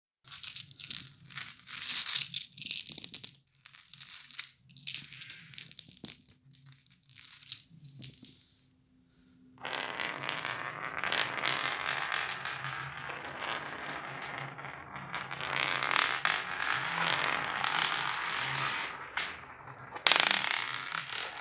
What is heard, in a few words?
Marbles